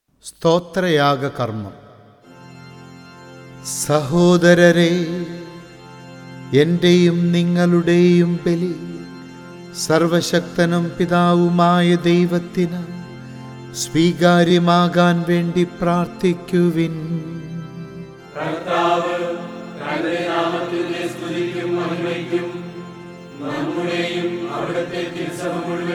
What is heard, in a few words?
Chant; Sthothrayagakarmam; ordo cantus